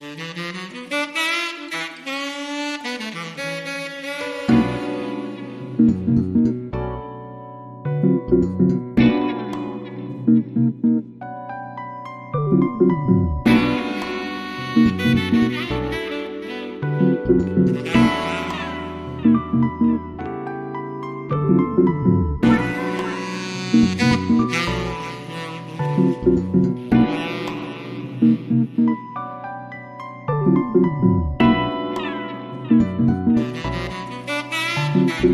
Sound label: Cool Off No Drums